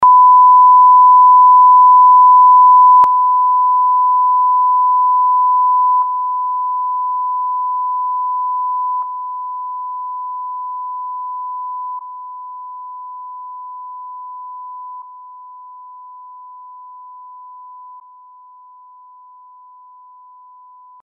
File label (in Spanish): 1khz atenuado